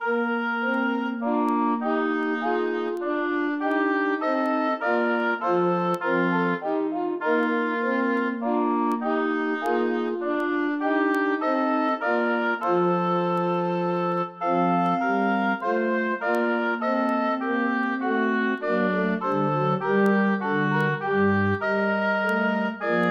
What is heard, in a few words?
jerusalem alt; Cornelius, Peter